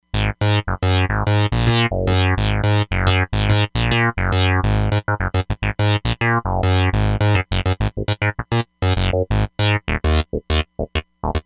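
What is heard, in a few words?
ResoSyn Bs, synth bass; Roland Fantom XR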